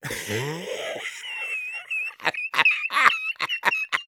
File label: Soldier laugh long, de; Langes Gelächter 2